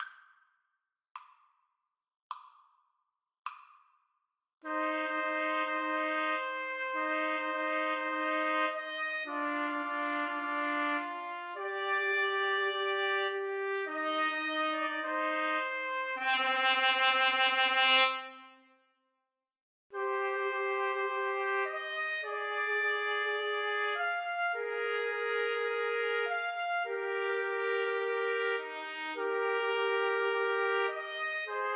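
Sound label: summer 2nd movement four seasons, Trumpet Trio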